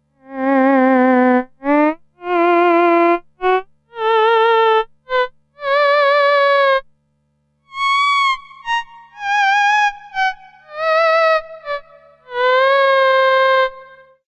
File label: theremin